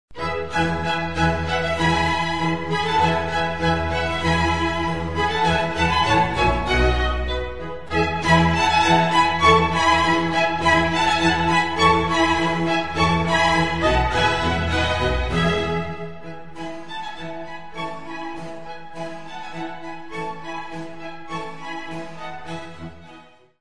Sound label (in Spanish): musica clasica